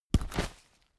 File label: umbrella telescopic close fast